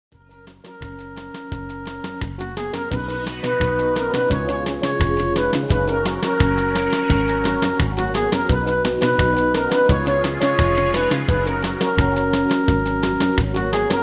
music horn sample midi

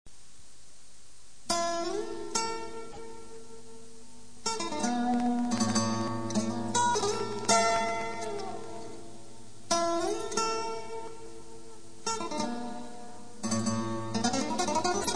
acoustic

